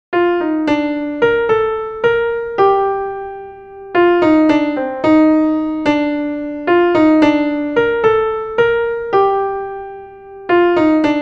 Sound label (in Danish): sopran